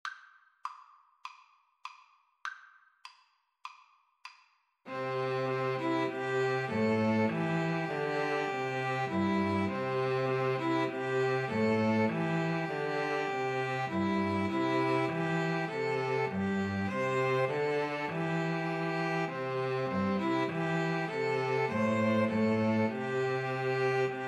jasmine flower, String trio

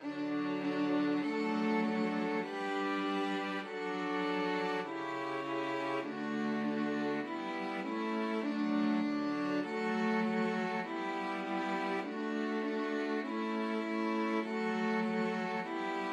twinkle twinkle, string quartet

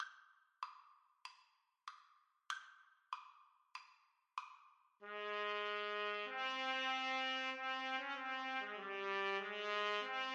trumpet duet

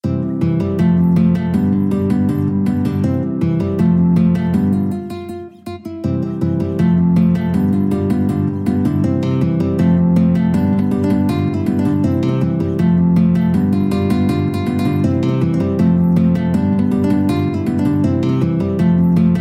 la bamba, guitar ensemble

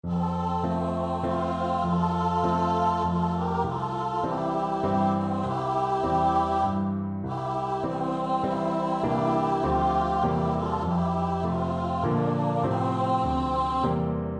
2 VOICES, vocal duet; sing your way home

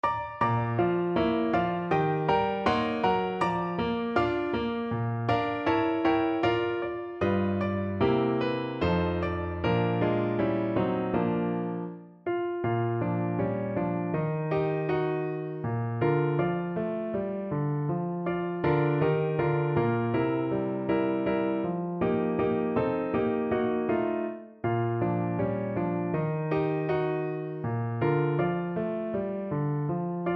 horn, French Horn; Egbert Van Alstyne - In The Shade of the Old Apple Tree

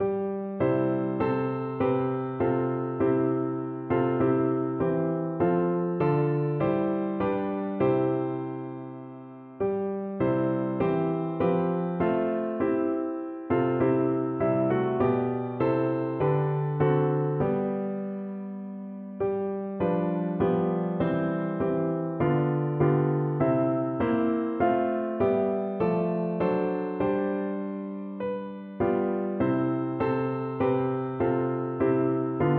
while shepherds watched piano

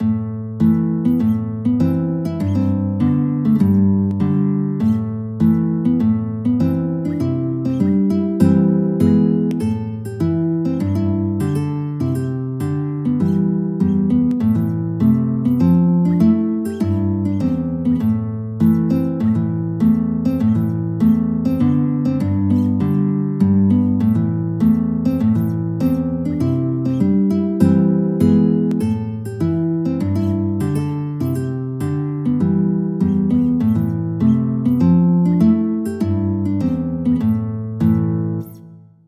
The Banks of Spey guitar

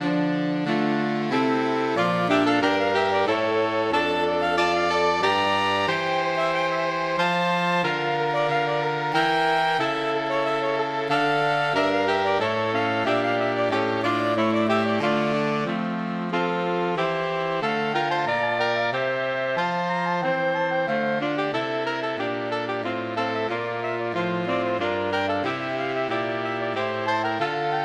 saxophone quartet

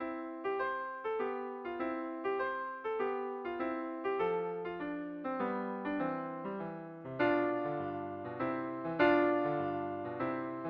Sonatina, piano

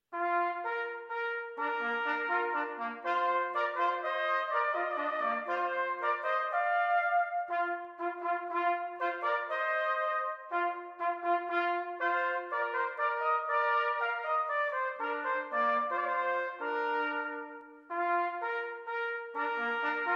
Menuet for 2 horns; TWV 40:110

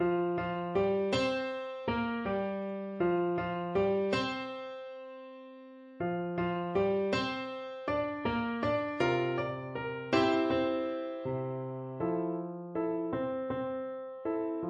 FL kar, Flute

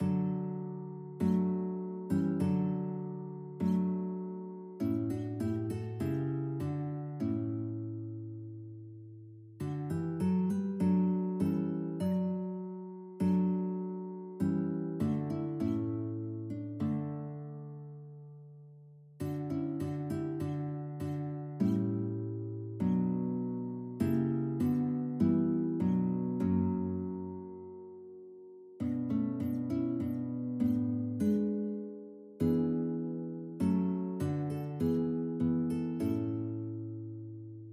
guitar duet

